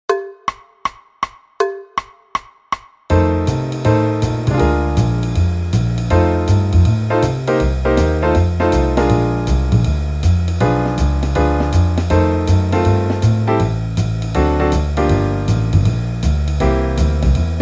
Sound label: Concert F Blues - Three Choruses, high school jazz band